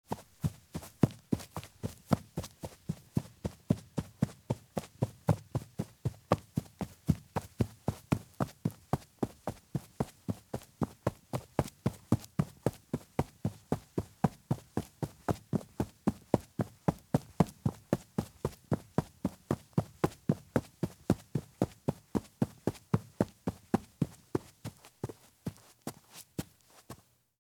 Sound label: Women's Thin High Heel Shoes Run on Grass; Sound Ideas; Footstep Sound Effects